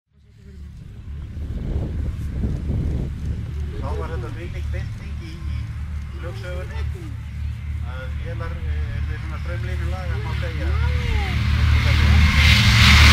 Douglas DC-3; هواپیما داگلاس دی سی تری

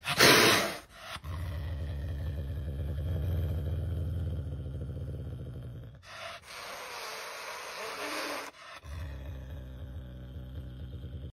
Caracal

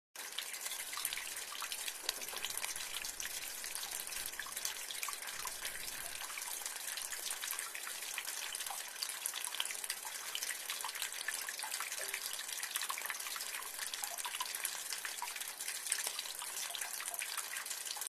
Rain on gutter

